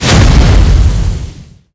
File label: Fire Boss Area Attack